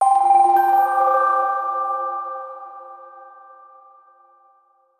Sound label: SFX Secret Code Unlock